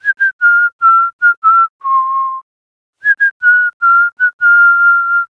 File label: Whistling